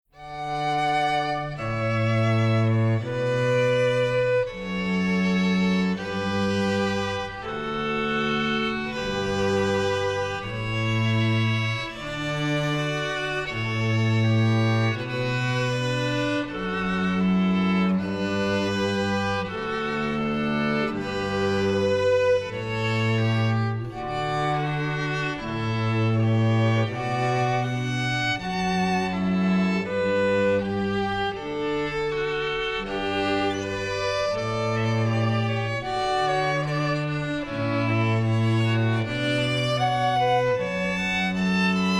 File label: trio canon in d